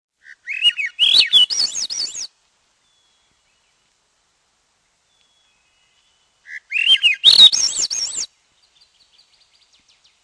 swainson s thrush

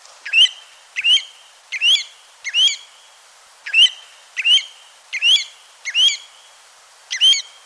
Pacific Golden-Plover

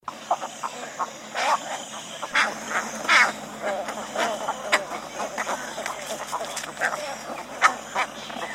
Cattle Egret

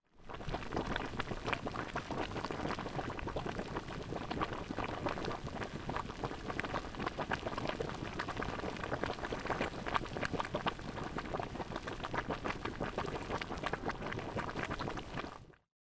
Ear Spring

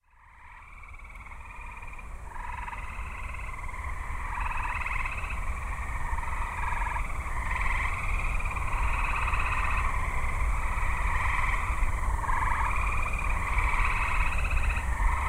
tree frog

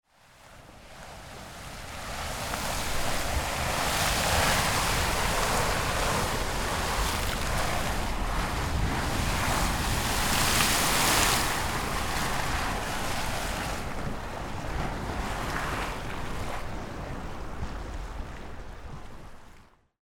Cliff Geyser

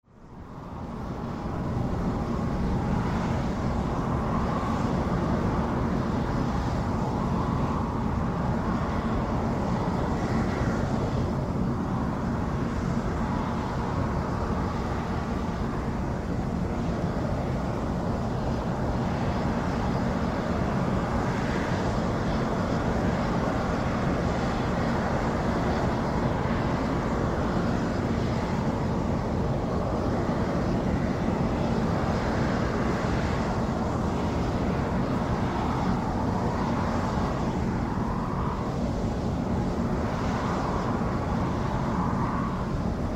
Beryl Spring Binaural

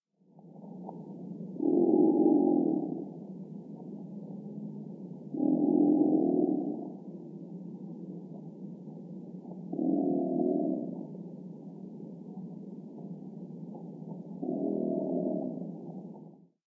alligator

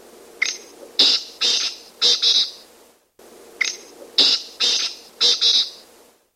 Black Francolin

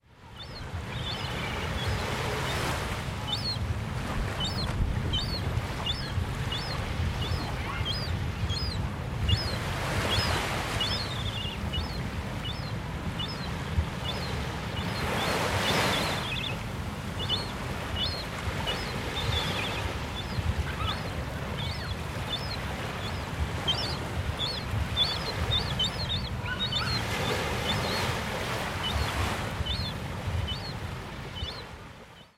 Aquatic Park Baby Birds

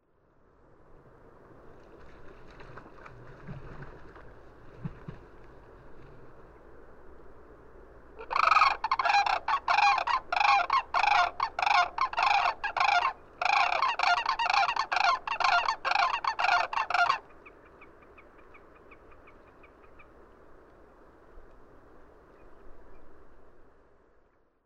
Cranes

